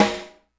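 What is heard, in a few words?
snare drum, orchestra percussion; Greytsounds VOL-1; ORCH PERC 3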